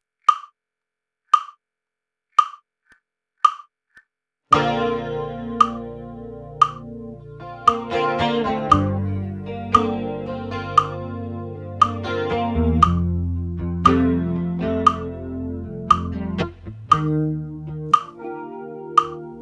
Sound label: clicked, drums